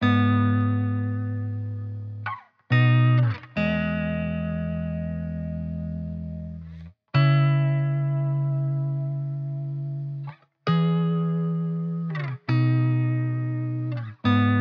135 BPM G# Min Main Guitar; Loop Stems; Cymatics; Reasons

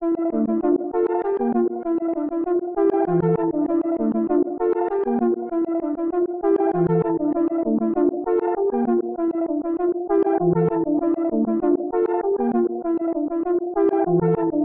131 BPM D Min Main Melody; Loop Stems; Cymatics; 2022 Melody Collection Platinum Expansion - Pt 2; Triumph

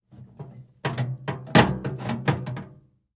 Garbage Can; Fairlight Sound Lib

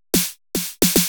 Snare Fill 110Bpm, House; Ghosthack Advent Calendar; House Kit; House Construction Kit

